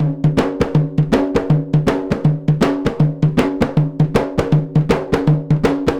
PERC LP, Arabian Traditions; USB Soundscan vol.43; AKAI

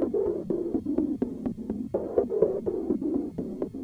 Juno-60 Arp 125BPM

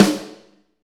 SNR snare, Snares; Northstar - Drumscapes Roland

